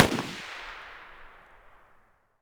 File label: an94 muzzle mono far; STALKER; IWP; abakan